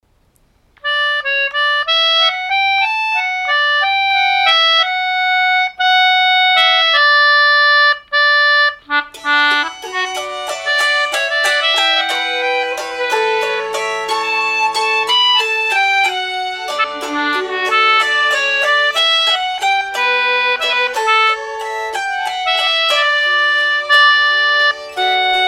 cowboy waltz

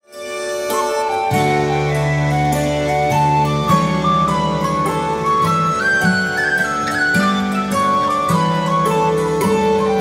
waterloo hornpipe

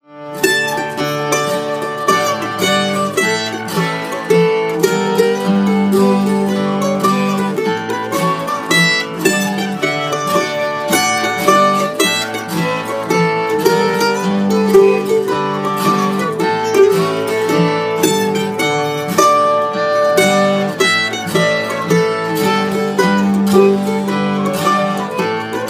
shuffling about slow

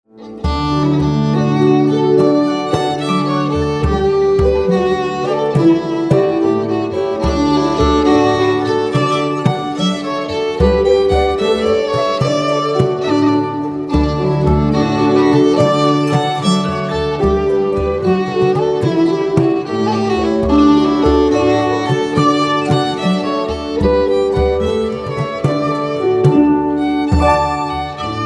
smash windows slowish in D

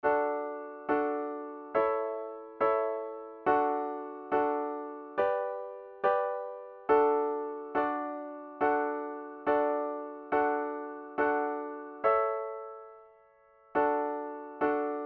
Spotted Pony plain

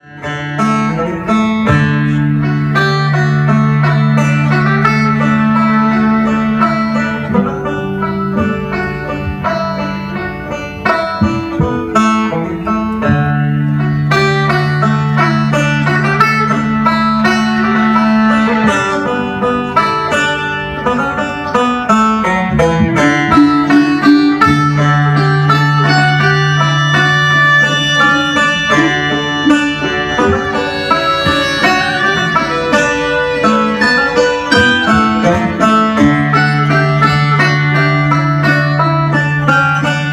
Air short and slow

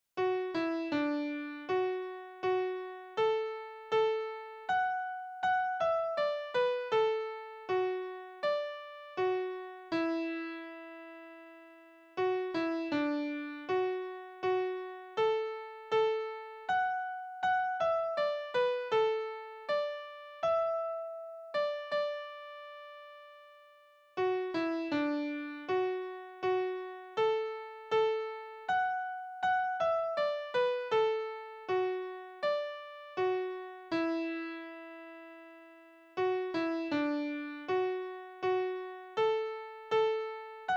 Year of Jubilo melody only

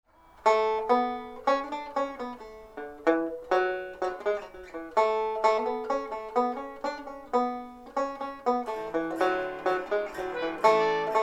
reg mandolin

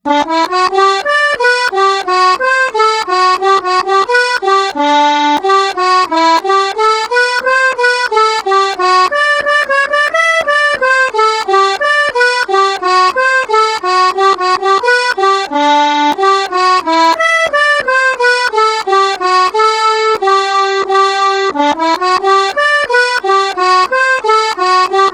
hornpipe slower

